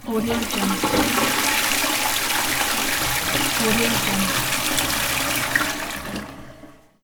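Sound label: toilet sound; wc sound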